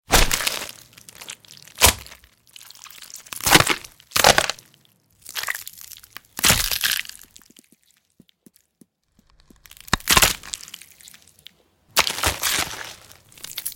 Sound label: Gore; Big Room Sound